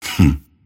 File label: grimstroke laugh; dota